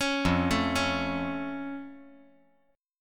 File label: F Augmented F